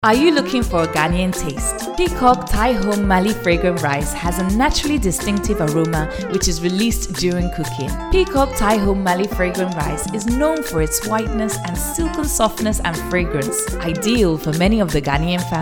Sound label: Commercial Bed; Peacock